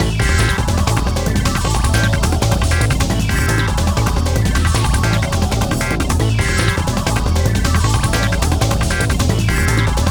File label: Pinch; pac man world 2 ps2 gamecube xbox; Pac-Attack; Arcade